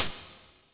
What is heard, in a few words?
hihat